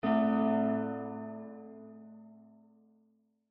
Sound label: bell